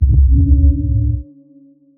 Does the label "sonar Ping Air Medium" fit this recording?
Yes